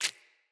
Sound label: Root Spider Step Water, Foley; Animals